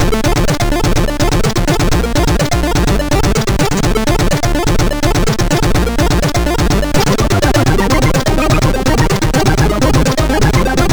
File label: sid MOS6581R2; Drrsh